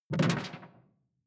sci-fi alien bubble; swooshes-swishes

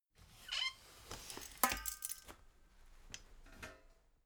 folding chair unfold slow; objekte; stuhl

